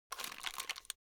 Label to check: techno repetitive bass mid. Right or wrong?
Wrong